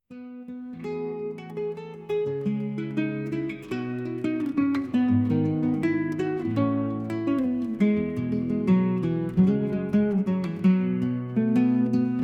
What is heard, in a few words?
Relaxing Guitar Melodies Soft Guitar